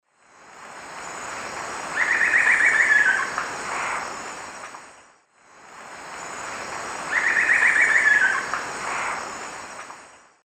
Dendrocolaptes certhia, song; S. America; Eastern Lowlands

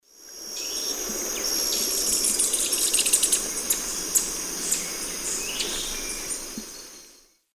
Tangara schrankii, flight and landing calls; S. America; Eastern Lowlands

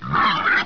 BOAR, animal; 动物

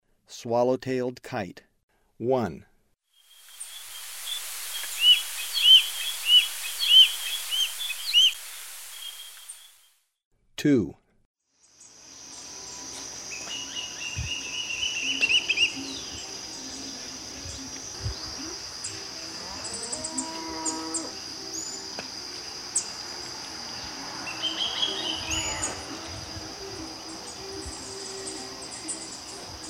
Swallow-tailed kite, Ecuador; S. America

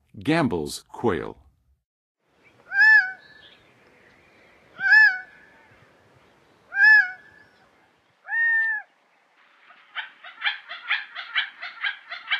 Gambels Quail, Songs of Birds of North America; Western USA; Western USA Songbirds

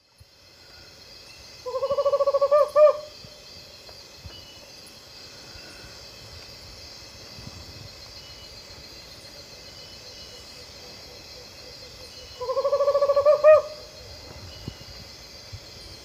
Megascops choliba, song; S. America; Eastern Lowlands

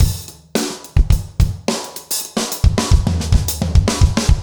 urban grooves 110

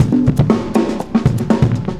cooling 120 bpm; loops

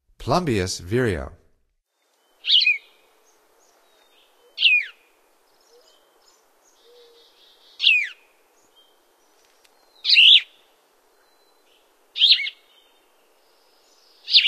Plumbeous Vireo, Songs of Birds of North America; Stokes

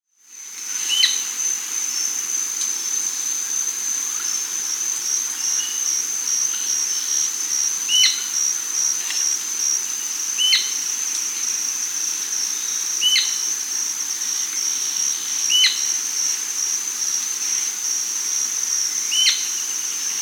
Amazonian Royal-Flycatcher (Onychorh; S. America

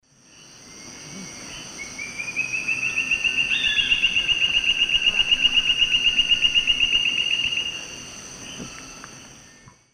Berlepschia rikeri, song; S. America; Eastern Lowlands

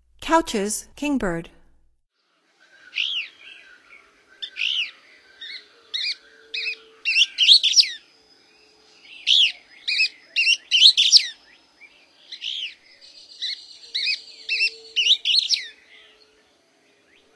Couchs Kingbird, Songs of Birds of North America; Stokes

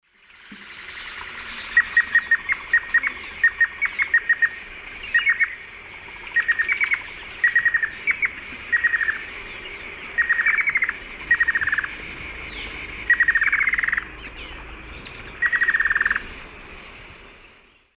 Myrmochanes hemileucus, fast song and duet; S. America; Eastern Lowlands

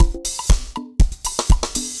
dancefloor perc 120 bpm

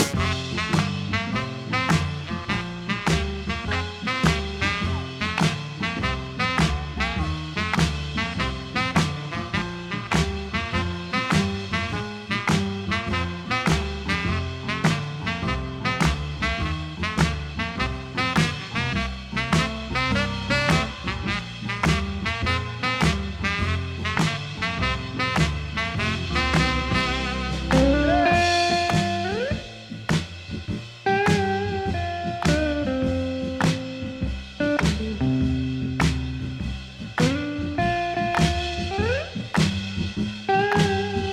Honky Tonk

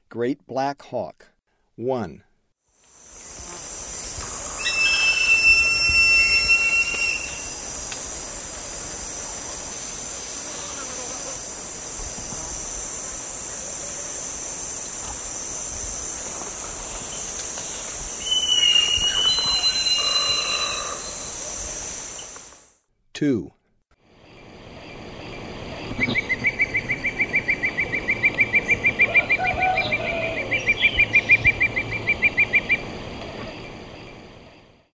Great Black-Hawk; S. America; Eastern Lowlands

